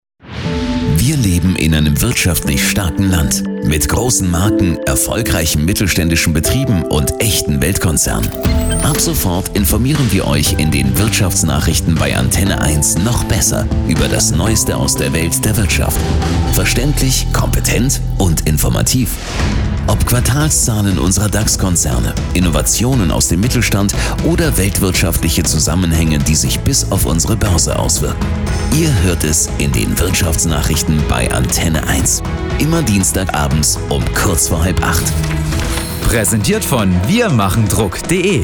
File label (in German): trailer; wirtschaftsnachrichten